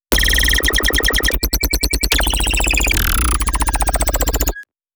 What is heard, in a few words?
hybrid trap bass